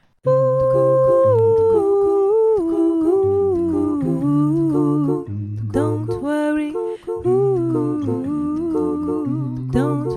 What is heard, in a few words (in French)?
voix